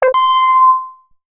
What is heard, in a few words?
new question; lastAvatarStandingTrivia